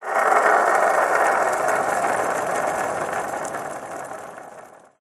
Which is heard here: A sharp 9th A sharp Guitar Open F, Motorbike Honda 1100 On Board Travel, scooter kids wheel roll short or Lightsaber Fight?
scooter kids wheel roll short